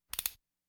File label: Hospital effects